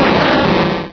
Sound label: cry; source of the sovereign